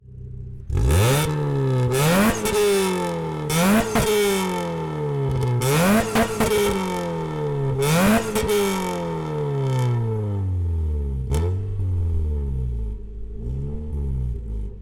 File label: Car Show